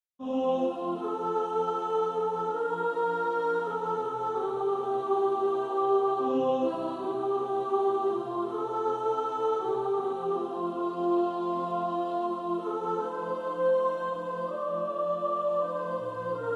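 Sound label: soprano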